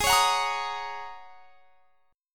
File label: G sharp 7th Suspended 2nd Suspended 4th G sharp; G#7sus2sus4; x,23,23,23,23,23; strummed